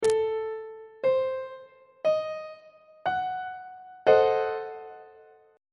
d double flat diminished 7th 3rd inversion on treble clef